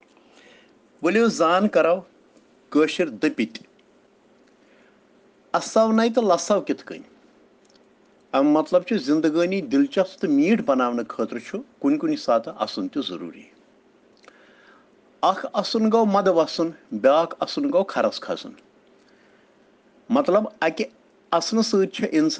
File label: Children Rhyms in Kashmiri